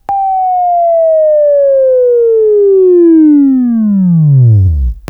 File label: Buzz; Labview-Program; Sweeping Training program